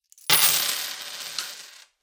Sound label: Coins Thrown On Counter